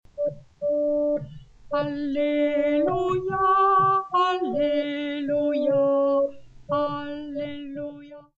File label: Alleluia Alto